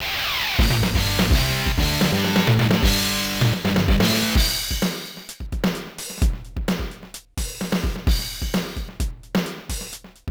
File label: Gain a Victory; Real Bout Fatal Fury 2 The Newcomers; SNK Neo Geo